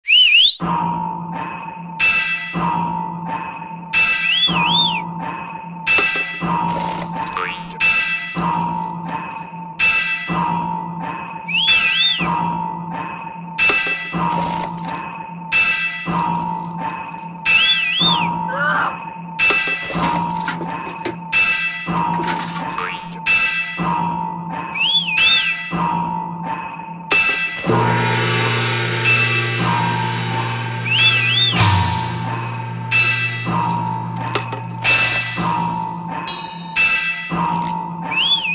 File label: mechanical dances ballet excerpt, orchestra of noises; nikolai foregger and his orchestra of noises; 1923; Baku Symphony of Sirens